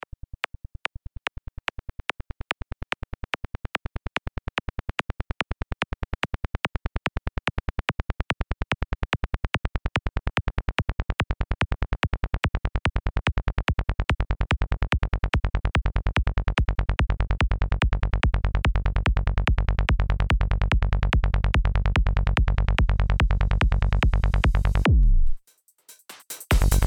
kick n bass

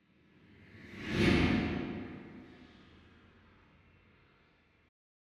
woosh

